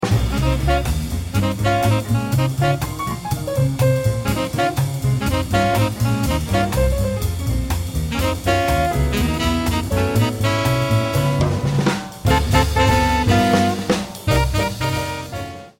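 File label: minus trumpet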